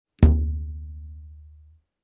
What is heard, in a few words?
Elastic rubber band pluck